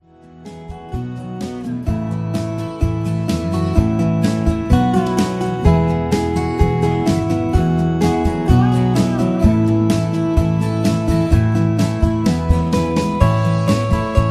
Angel Flight Instrumental

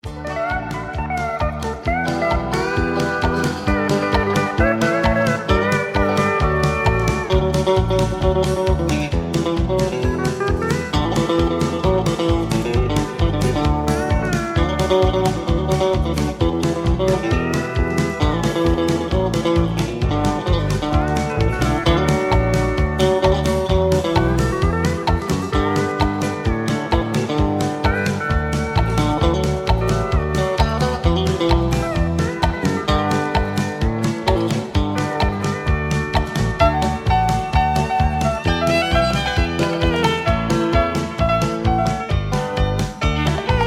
Instrumental

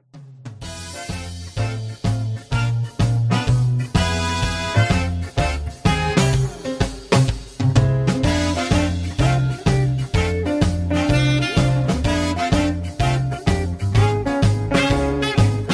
Instrumental with BGVs; Singing Calls

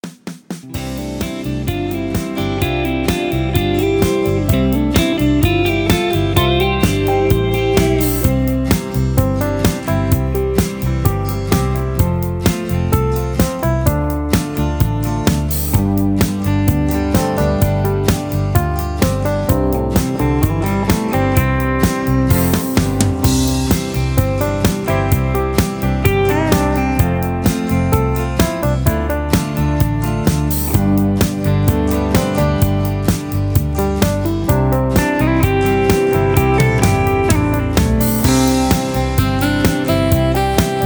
Instrumental Leads

